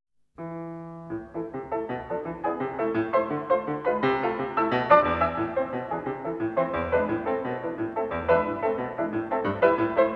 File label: Etudes A minor, Piano